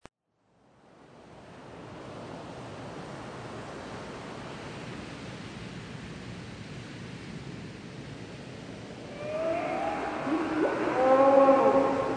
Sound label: Whale Song